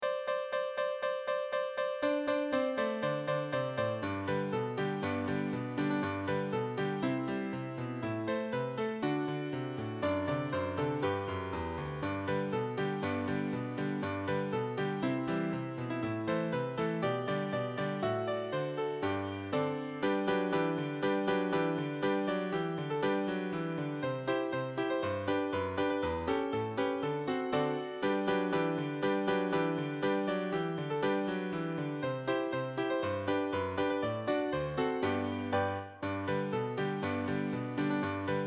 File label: jingle bells